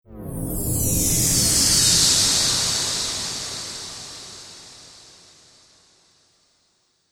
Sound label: Fantasy Spell, magic sound effect; Role Playing Game; Andrew Fleming